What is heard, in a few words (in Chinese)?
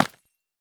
方解石破坏声